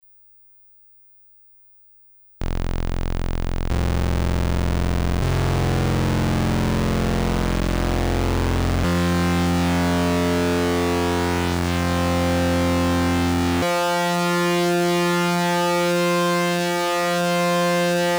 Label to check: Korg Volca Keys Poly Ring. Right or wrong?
Right